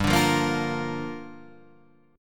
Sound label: A sharp 6th, chord